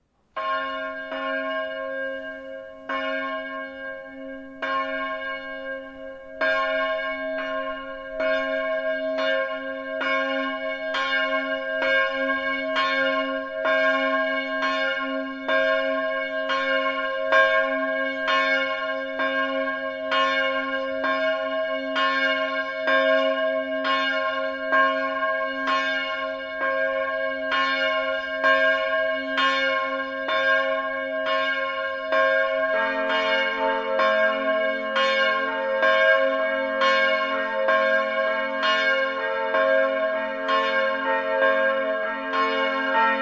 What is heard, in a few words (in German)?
Glocken gesamt